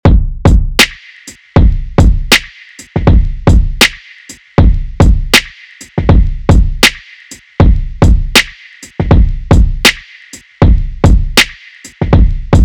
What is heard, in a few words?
drumbuss Drum Rack